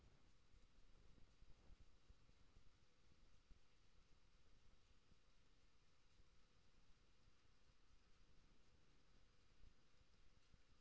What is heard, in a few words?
HYDROPHONE 16000Hz; JASCO AMAR HYDROPHONE 740